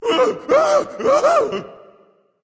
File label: fear berserker; cuchulainn